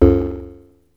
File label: Snare, Nord Modular Drum Samples; Yawgmoth